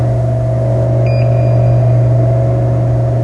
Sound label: amb monitor; cruisecontrol